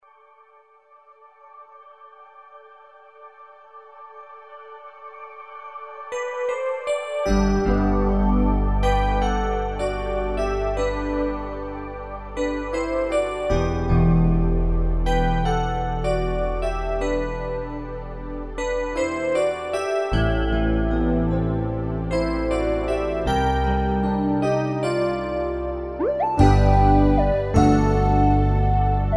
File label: taiko